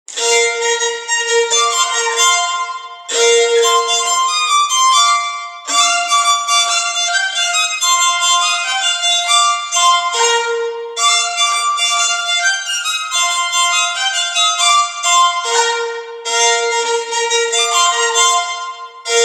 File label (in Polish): skrzypce